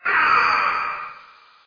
damage; mgs; gray fox

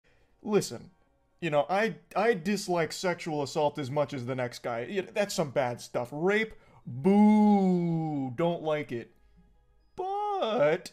boo; moist

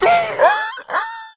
gnoll crit

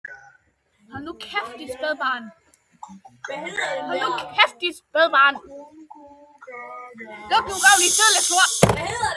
shhhhh

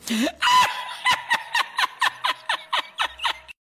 spanish laugh

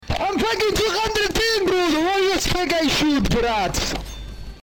ping

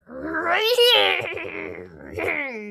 vine booooooooooooooooooooooooooooooooom mega

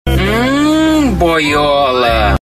hhhhmmmm

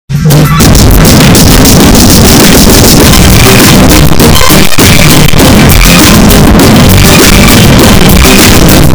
walking loud; putin

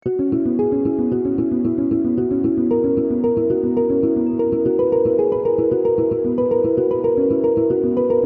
soft music

